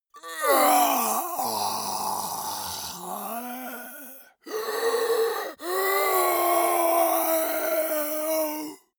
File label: monster die; kreaturen